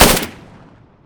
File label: fire